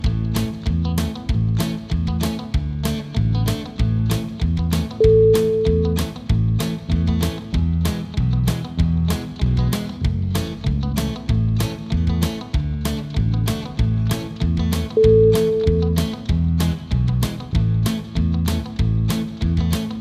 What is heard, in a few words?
twang happy underscore